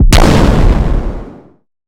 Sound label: Explosion